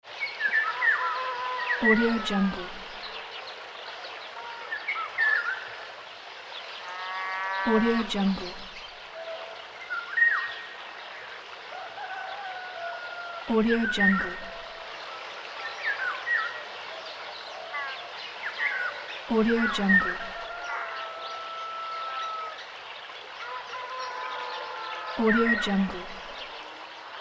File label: morning village ambient sound